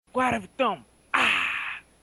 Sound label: haaaaaa; guaraviton